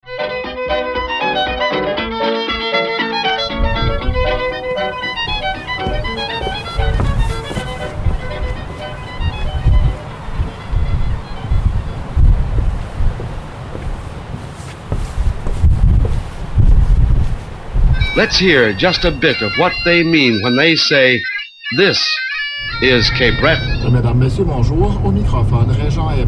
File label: PAD